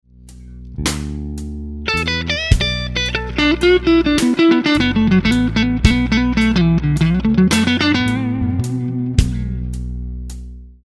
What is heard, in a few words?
Lick, guitar; Slow Minor Lick 11; Minor Blues licks